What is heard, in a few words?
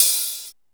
SP1200, DRUMMACHINES